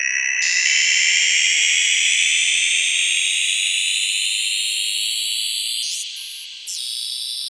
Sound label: Long Decay, FX